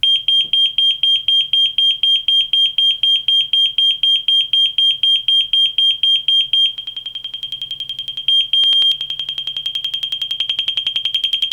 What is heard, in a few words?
Motorola pager alert